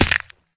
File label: fall big, damage